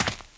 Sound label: dirt, footsteps survivor walk; Left 4 Dead 2